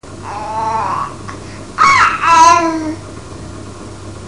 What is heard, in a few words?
squeek, baby; 5 mnths